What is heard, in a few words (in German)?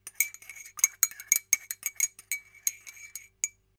Tasse ruehren